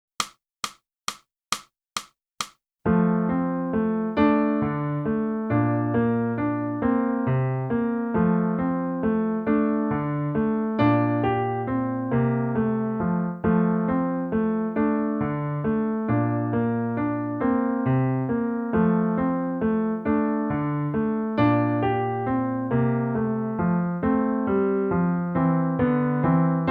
clarinet; Boosey Woodwind Method: Clarinet (Book 2)

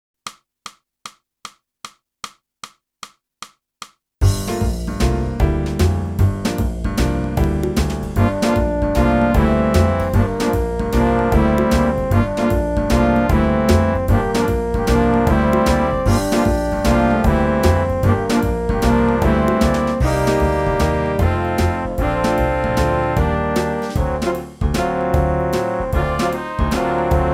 clarinet; boosey method

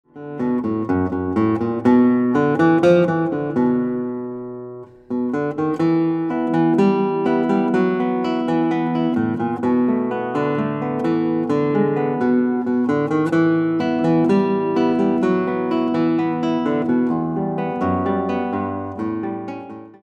Guitar

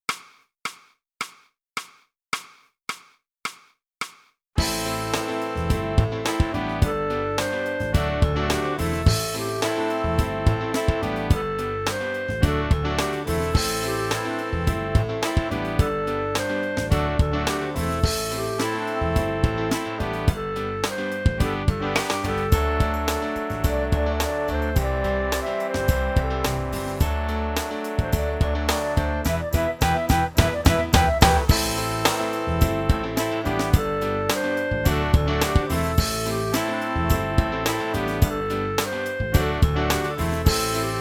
flute

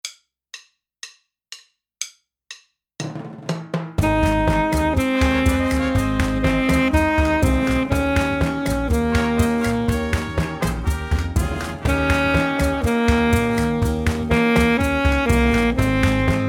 Teacher Sax

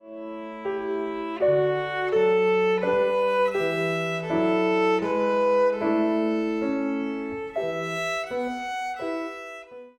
VIOLIN

